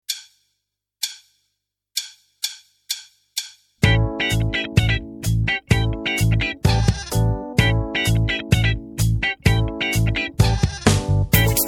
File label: D NO GTR